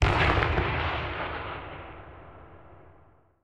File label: aa explosion far; War Thunder